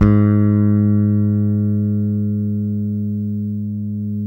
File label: Deep bass G#3; Spectrasonics; Bass Legends; DEEP-SIX